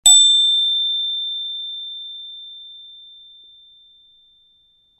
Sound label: sky slice venus